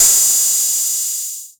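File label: TR606 Hat Open, Roland TR-606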